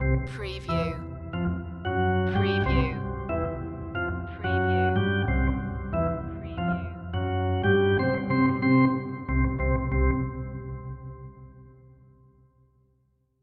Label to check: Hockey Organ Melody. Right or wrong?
Right